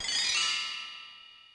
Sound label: Bell Tree, Yamaha DD-35